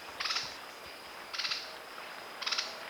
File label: Philydor novaesi calls spontaneous